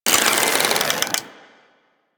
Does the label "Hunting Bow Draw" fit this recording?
Yes